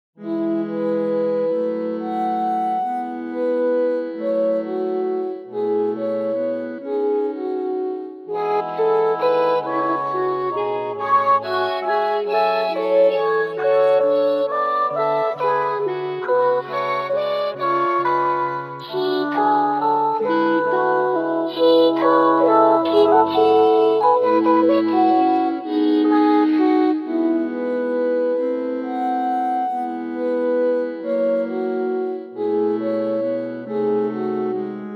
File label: chorus